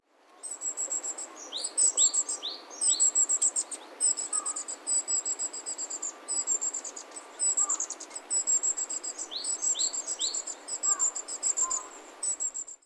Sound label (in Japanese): エナガ 幼鳥; さいたま市